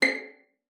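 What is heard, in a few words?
violin pizzicato C5; Xsample VOL-5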